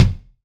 BD, BASS DRUMS; EdgeSounds

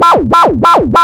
ALARM, SCI FI ALARMS; Zero-G; Ambient 1

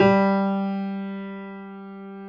piano G2; AKAI S-Series CD-ROM Sound Library VOL-8

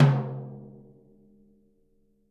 Living Drums; Sampleheads; Peter Erskine

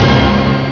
orchestra; Amiga Soundtracker Sample Packs

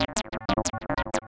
Heavy Industry Syn, Keyboards

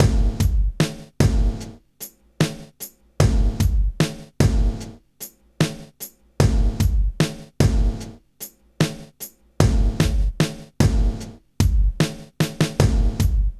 hip hop; INIS; Funky Xtreams

